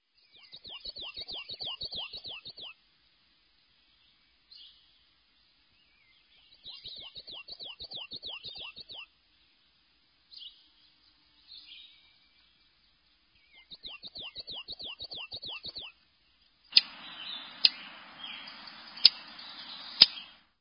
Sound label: Black and White Warbler